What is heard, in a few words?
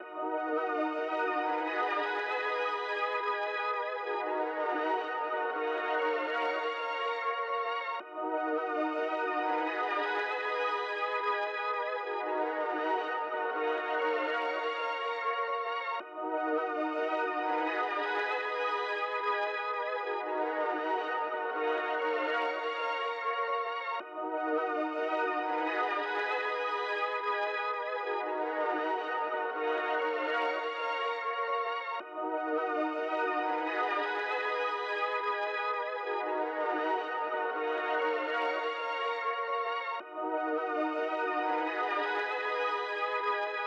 120 BPM F Min Texture; Cymatics; Destiny Gold Expansion; Blue Magic